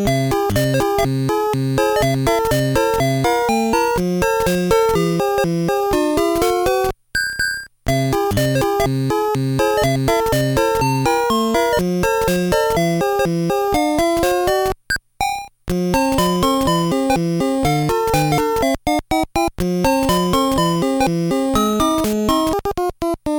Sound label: 8bit overworld